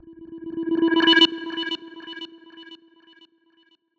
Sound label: Transition Alien Sonar; Transitions; Glitchedtones; Scene Switch